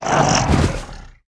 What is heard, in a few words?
bugzard dead, monster